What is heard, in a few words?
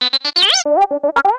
BB8; BB8bankfont